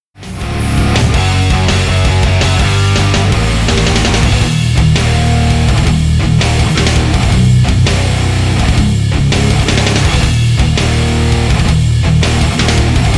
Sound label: Lick Challenge Backing, Guitar; Martin Miller; Lick Challenge 1